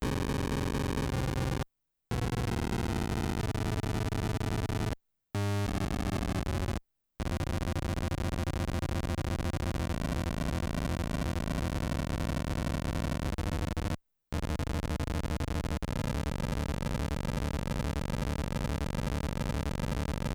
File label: Distort